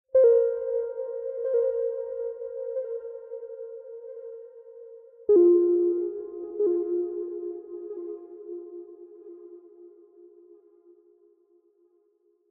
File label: Pings; Martian Chess